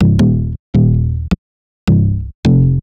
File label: Punch Bass; Sonic Foundry (Sony Creative Software); Knutrix Drum & Bass